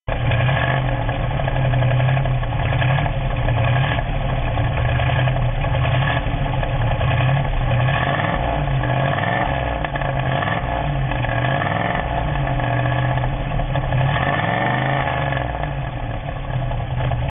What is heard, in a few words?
camaro, exhaust systems